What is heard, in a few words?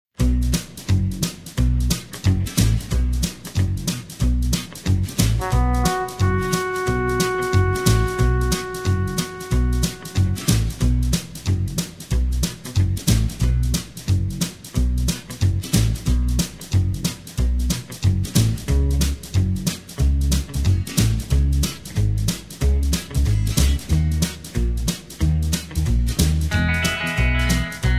(B To D) No Backing Vocals